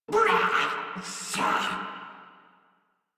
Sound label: Death Crewmen; Dialog; Barks; Battle; Warframe; Corpus Crewmen; Crewman D